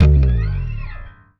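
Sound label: slap, bass; Xsample VOL-1; SLAP+THUMB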